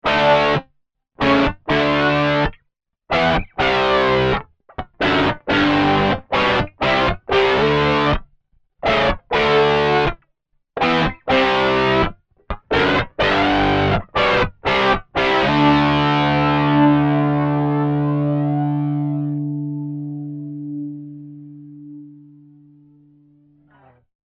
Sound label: Overdrive Flanger